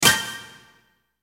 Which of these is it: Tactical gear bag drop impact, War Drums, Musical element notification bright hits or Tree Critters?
Musical element notification bright hits